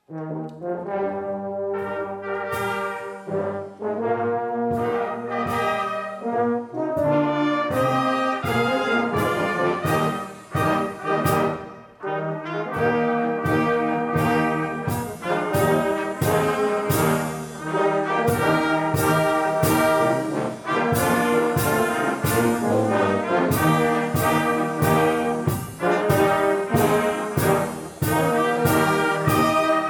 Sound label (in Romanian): Fanfara; Duminica dimineata - Invierea Domnului Isus